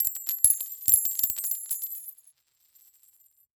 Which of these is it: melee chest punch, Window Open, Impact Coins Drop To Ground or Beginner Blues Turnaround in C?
Impact Coins Drop To Ground